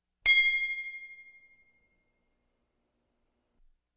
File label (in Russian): zvon bolshogo bokala